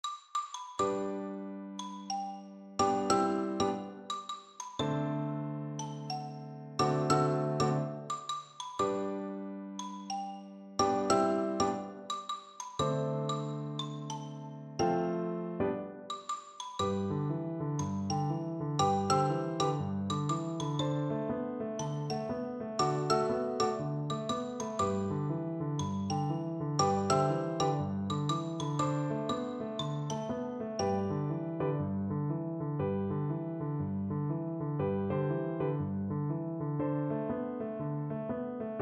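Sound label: whole world xylophone; percussion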